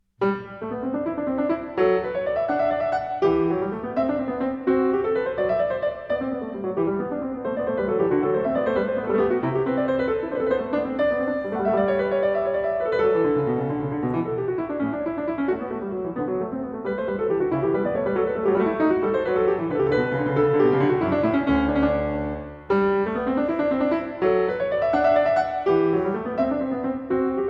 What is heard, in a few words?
Goldberg Variations, BWV 988 - Var. 27 - Canone alla Nona a 2 Clav.